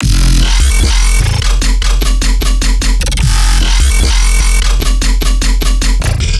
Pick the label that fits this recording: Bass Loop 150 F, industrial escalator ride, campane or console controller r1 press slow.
Bass Loop 150 F